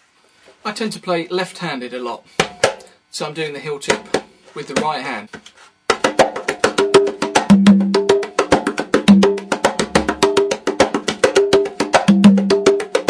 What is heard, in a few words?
son montuno left, conga